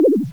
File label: drop disabled